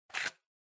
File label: PC Hardware PC Card Eject